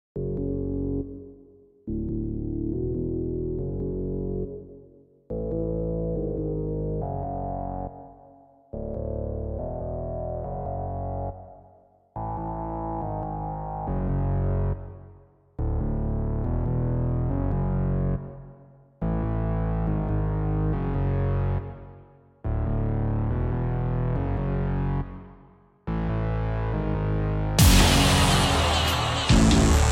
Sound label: Electro Dubstep Remix